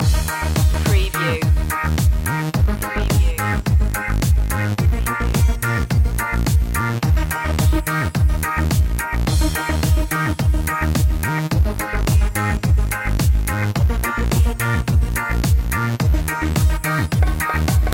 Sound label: caribbean party loop